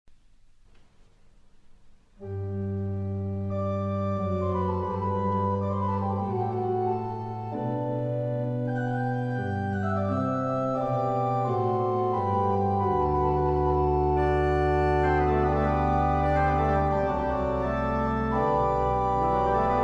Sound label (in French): pièces d orgue; Dandrieu J.F.; Premier livre de pièces d'orgue, Suite No. 4, Tierce en t